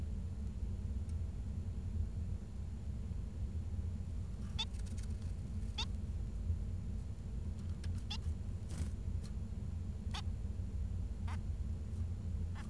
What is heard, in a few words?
bengalese finch